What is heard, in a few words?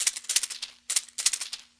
Seed Pods, Ethnic Percussion; Sonic Foundry (Sony Creative Software); Ambient Atmospheres & Rhythms